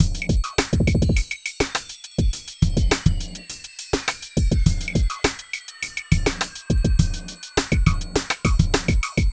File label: Beat